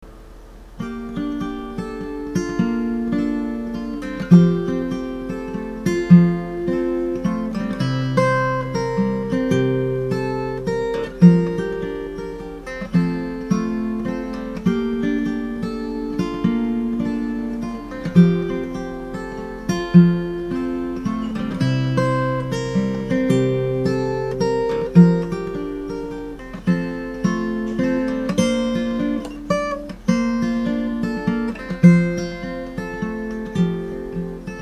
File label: Guitar